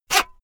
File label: saw use short fast